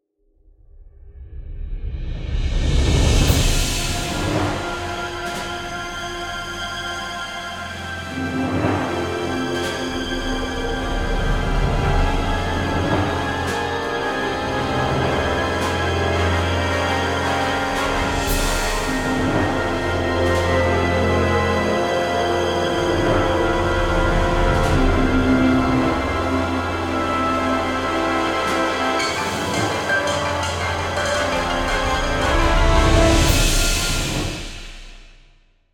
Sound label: Tense; Star Trek Resurgence